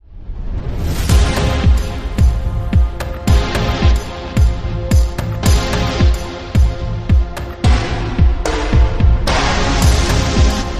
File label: Atmospheric Distortion